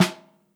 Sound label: Pearl 14 Snare; BLACK PEARL